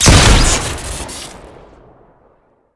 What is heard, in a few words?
gun shoot short crit; tf2